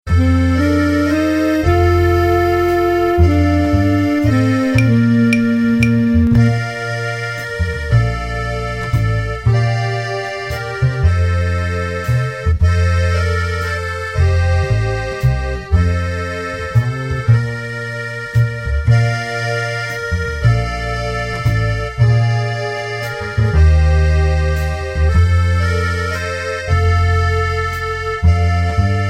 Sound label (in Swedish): Fransk vals, klarinetten